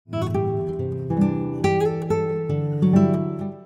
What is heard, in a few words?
gliss, guitar; chord coloring guitar